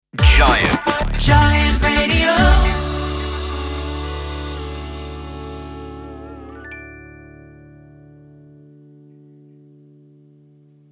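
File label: Sung Jingle, 110bpm D major, Sting; Giant Radio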